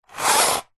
table tennis portable retractable net pull open out plastic housing fast; zapsplat